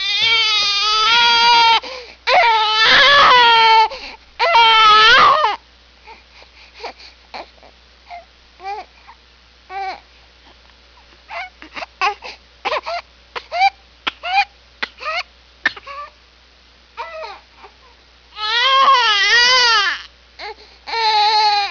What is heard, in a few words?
wail